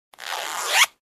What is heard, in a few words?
Cloth Purse Zipper close